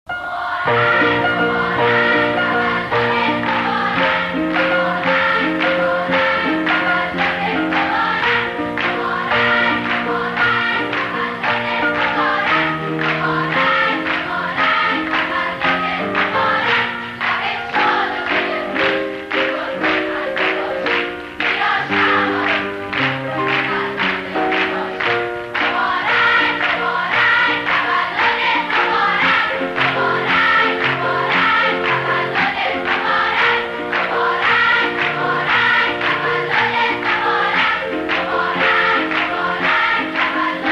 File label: Happy Birthday Music